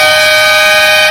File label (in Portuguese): buzina